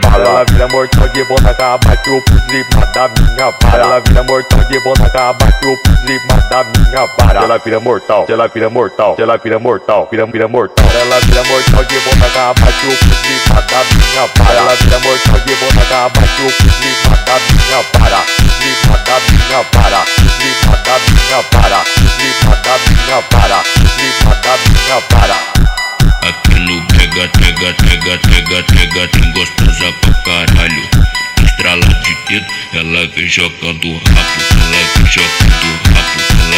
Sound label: phonk